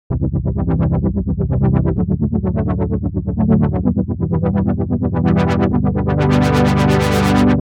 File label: Supersaw